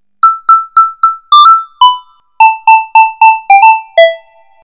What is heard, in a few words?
lead